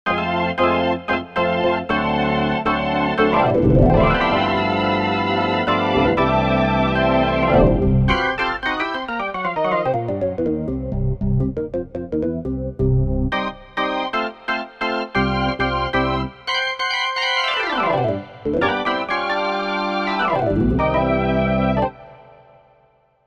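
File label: Electric Organ, Korg MSC-1S; Korg MSC-1S Variety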